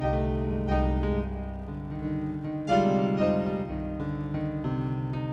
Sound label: Piano